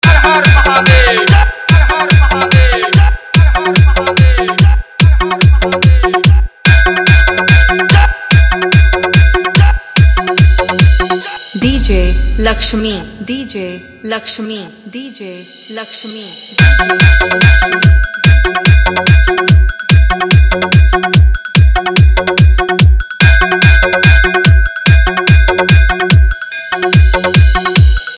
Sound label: Competition Music Bol Bam Dj